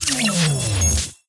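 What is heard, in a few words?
win; paper dash2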